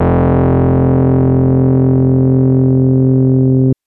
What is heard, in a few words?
Synth FM 2 Op Basic 3 c3